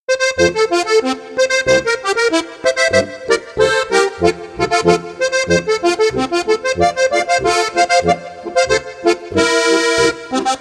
boarischer

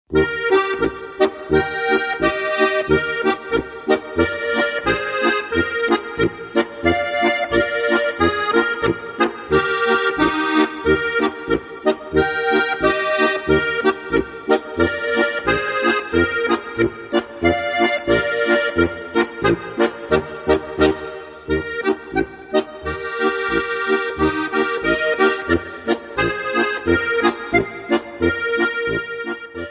polka; Volksmusik- leicht gemacht

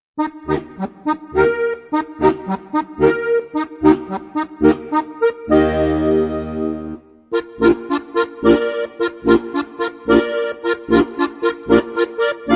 Flugwild tot Signal

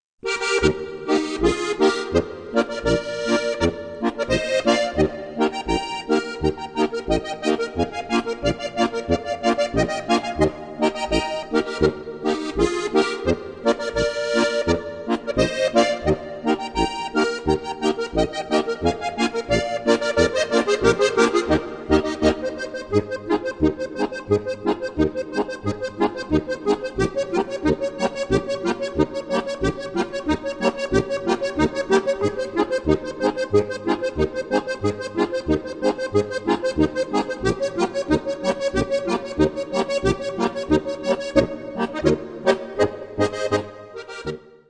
boarischer

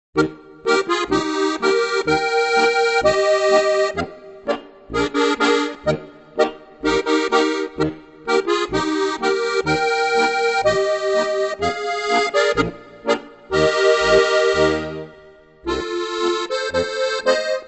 boarischer

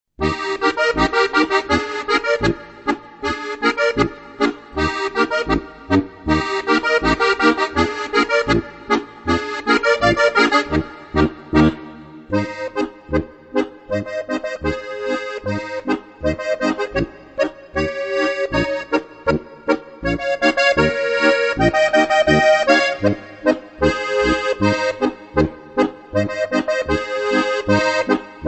boarisch; Leicht & Guat - 15 leichte Boarische

